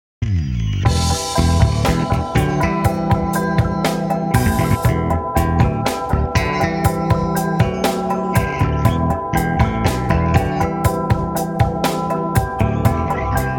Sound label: time lapsed octaves